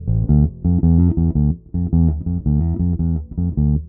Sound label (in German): bass